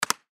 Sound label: Flugelhorn Case, Flugelhorn Case Latch Lock; Brass; Musical; Blastwave